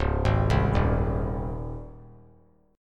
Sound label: C sharp Major 7th Suspended 2nd C sharp; C#M7sus2 arpeggiated; JGuitar